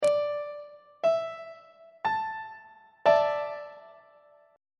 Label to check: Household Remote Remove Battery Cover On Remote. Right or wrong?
Wrong